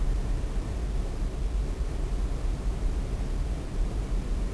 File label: ambient smooth noise; chaoscity